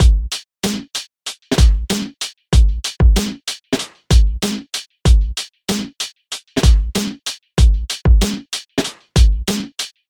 Lo Fi Beat

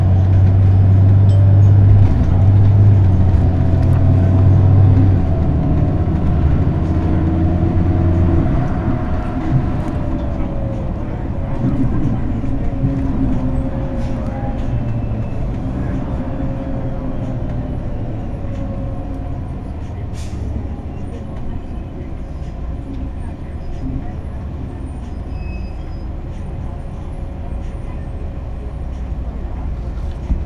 Metro, subway

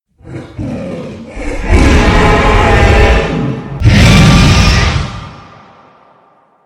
Monster Roar

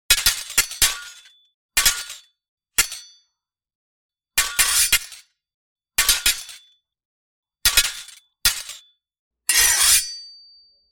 Sword Hits Sword Fight Sequence; Demonslayer Inspired

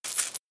Coins

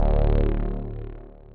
Bass D

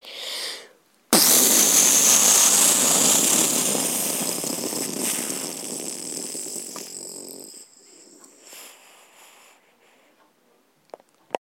Bomb Voice Imitation

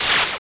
Hit Land Push

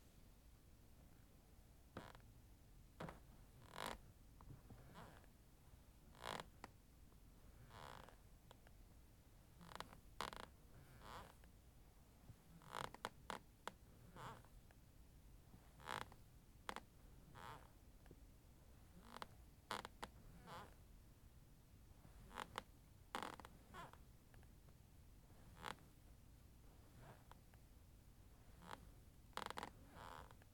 Creaking Floor

